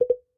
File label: error